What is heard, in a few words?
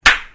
click